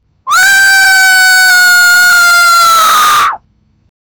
cartoon character scream; gameplay

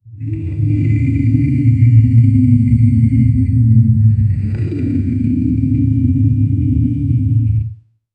deep fantasy sound effect, bass heavy

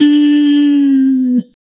adult simba lions

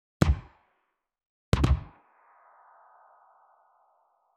bow and arrow

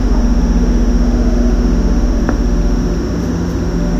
watching tv together

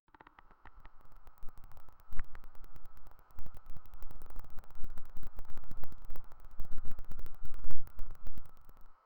stylized organic sound of, flora

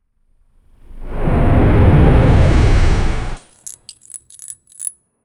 casting a quick spell

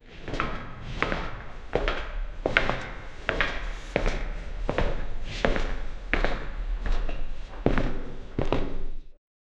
heavy slower footsteps, prison

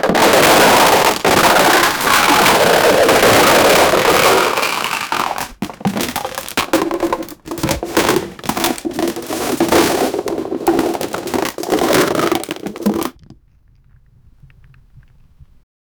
multiple snack packs and, falling objects

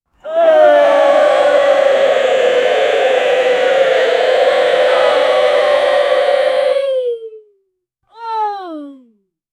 audience in, game show

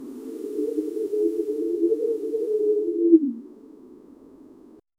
calm airy sound of a

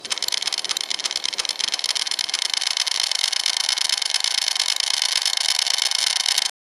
a mechanical spinning sound; games

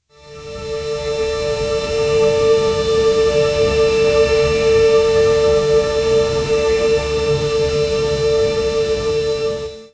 a subtle evolving stereo, synthesis

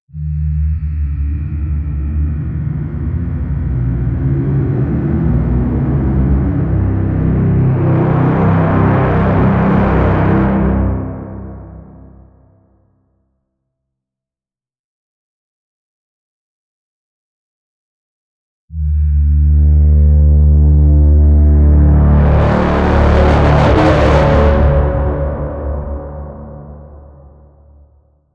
menacing deep booming med

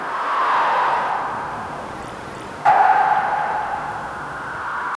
distant mutant, horror ambience

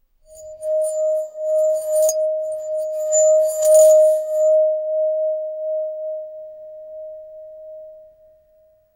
wine glass ring friction

